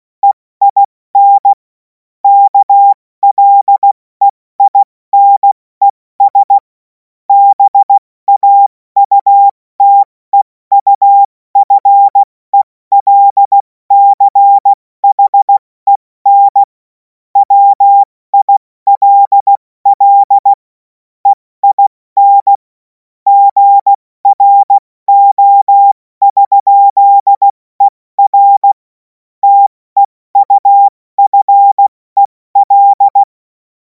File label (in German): Witz, cw morsen, 70 F80; Tempo 70 F80; hamradio; Witze/Joke; Morsekurs